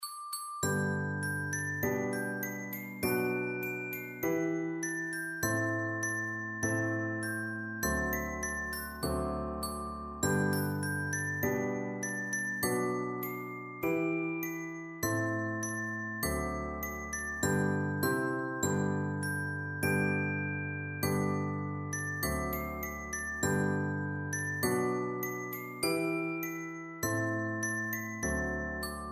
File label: simple gifts, percussion